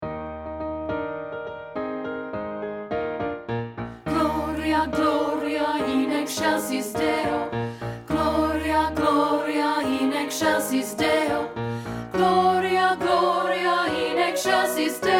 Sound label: ALTO; GLORIA